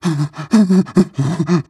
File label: breath long, zombie